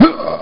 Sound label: HIT; BattleSNDS